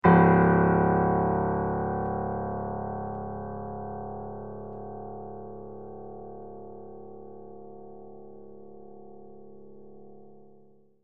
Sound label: loud db1, piano